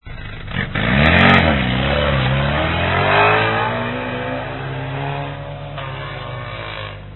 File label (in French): Démarrage de la Midget